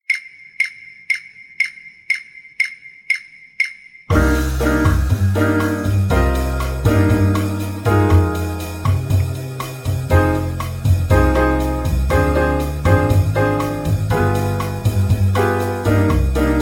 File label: Horns 120 No Improv